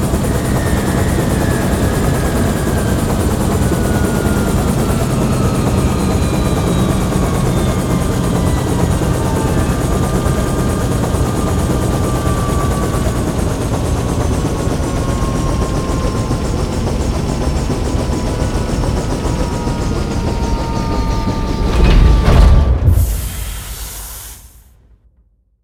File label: land